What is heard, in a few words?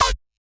Raptor Bass One Shot F, Dubstep, Synths; One Shots; Cymatics; Dubstep Starter Pack